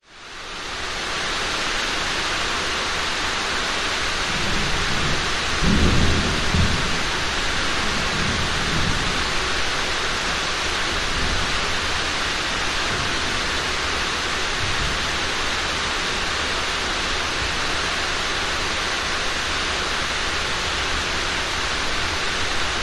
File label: rain warehouse heavy rain thunder on roof increasing slowly occ traffic; outside; zapsplat